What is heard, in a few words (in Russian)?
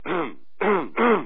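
не может откашляться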